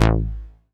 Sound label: Bass Multi TR A; Vengeance Samples